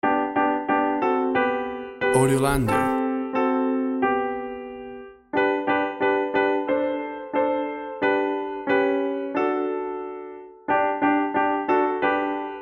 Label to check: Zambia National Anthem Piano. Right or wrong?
Right